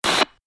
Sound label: TV channel static noise